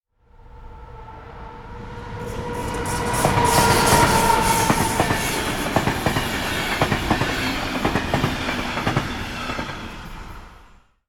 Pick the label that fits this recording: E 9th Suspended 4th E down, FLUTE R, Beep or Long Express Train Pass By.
Long Express Train Pass By